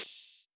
Open Hat

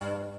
synth

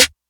snare tight latin

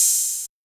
Open Hats

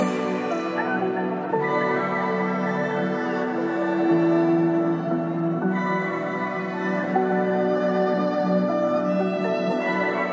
VIOLON BPM 128

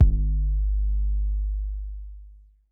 808's

